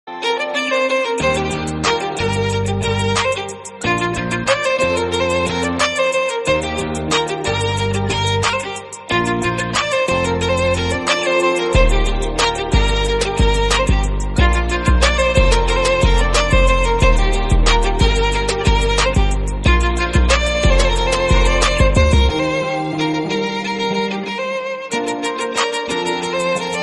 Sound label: mood less bgm mood off bgm